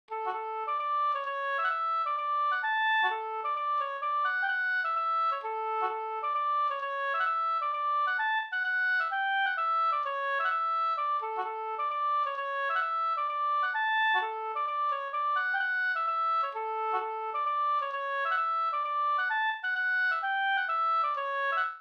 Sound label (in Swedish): hambopolska; Ahlstrom147